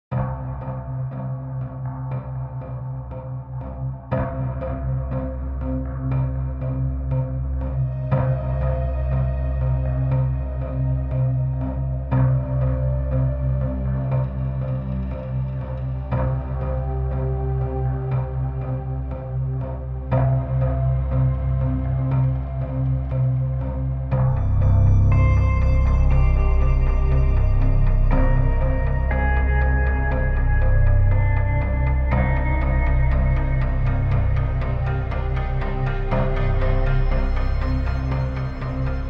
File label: industrial cadence; guitarsynth x; sample fuel